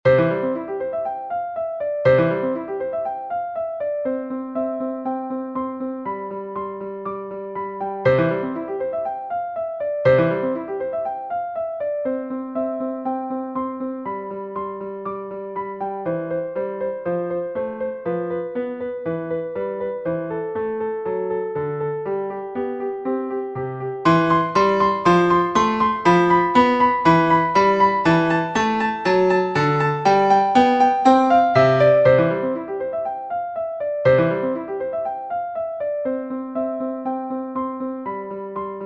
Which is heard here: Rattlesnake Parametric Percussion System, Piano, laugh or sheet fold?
Piano